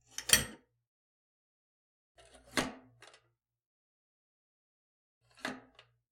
Household Drain Plug

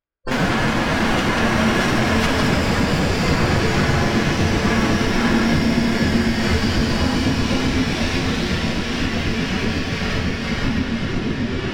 Spaceship Landing Fantasy Space Machine

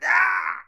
Pain

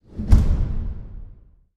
Big drum

